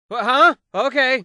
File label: cough; puppetshow BOSS cough; BattleBlock Theater narrator voices